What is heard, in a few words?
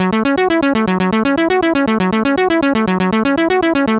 synthwave lead pluck in fm